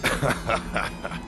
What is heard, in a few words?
Orc laugh